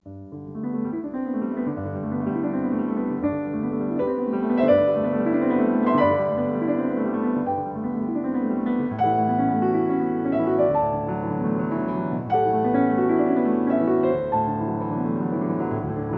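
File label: G major Vivace, piano; Chopin; Preludes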